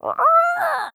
mannequin grunt; headcrab classic, scripted; creatures

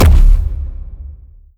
step layer, dog; npc; scripted

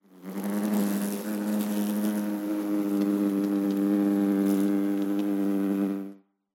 amb flies buzzing; Half Life Alyx

